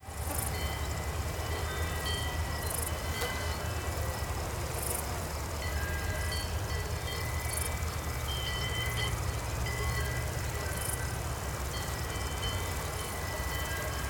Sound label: Mattmark dam Meadow; Google Earth VR